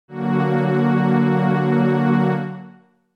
F# F# Augmented

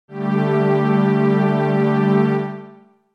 G Suspended2

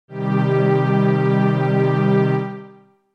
C Minor

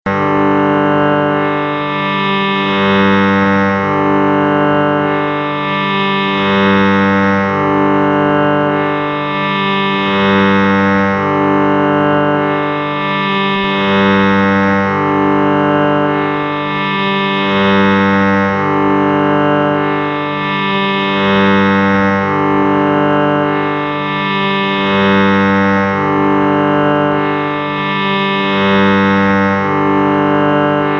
Sruthi G

